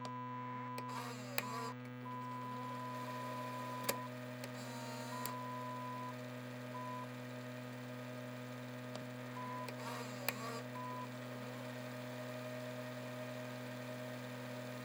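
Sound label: ambience computers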